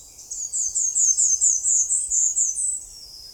Hybrid Warbler; Birds

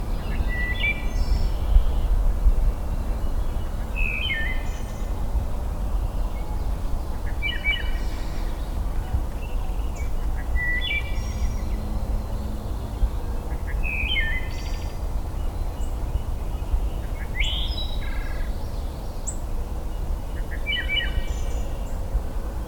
Hylocichla mustelina; Wood Thrush; MI; Michigan Bird Songs

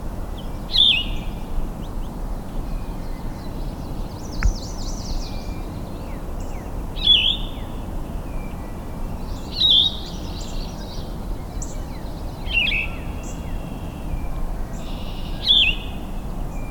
Vireo flavifrons, Michigan Bird Songs; Yellow-throated Vireo

